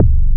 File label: ANALOG BASS